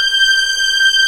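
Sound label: G6 legato, violins; East West; The Ultimate String Collection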